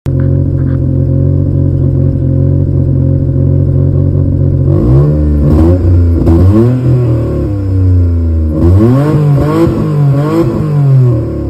daewoo matiz

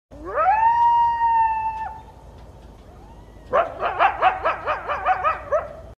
Coyote; زوزه; کایوتی

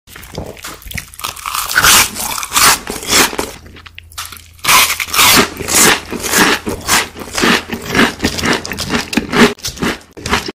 Eating fried onions

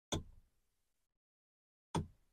Lamp on off switch; کلید روشن خاموش لامپ

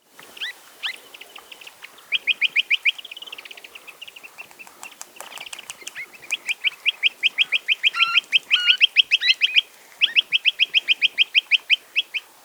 Chinese nuthatch; پرنده کمرکولی چینی

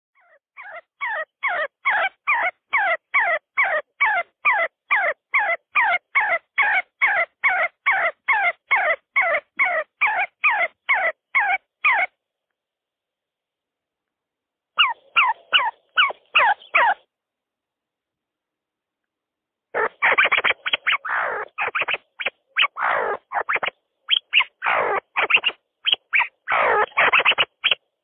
See See partridge sound

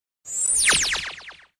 Ray

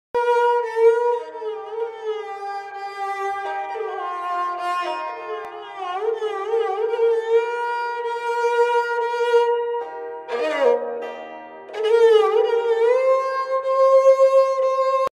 sarangi

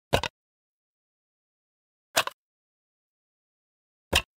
plastic container

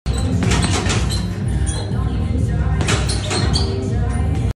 Punching punching bag; مشت زدن به کیسه بوکس

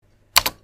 enter button

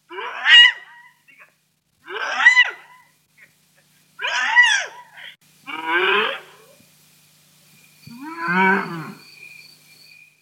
hyena sound

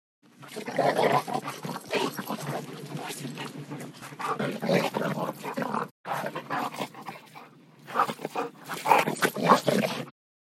Bear Sound

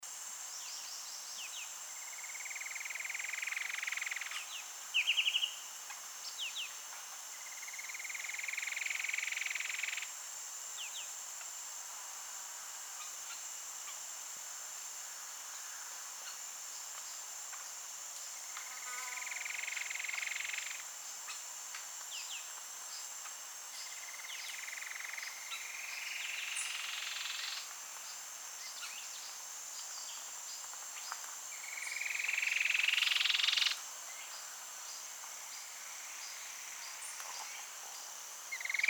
Cherrie's antwren

